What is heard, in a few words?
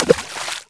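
splash small; The Elder Scrolls III - Morrowind; Morrowind GOTY GOG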